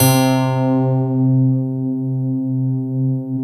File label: SYN GLOCK D5; Sound & Vision - Gigapack I CD 2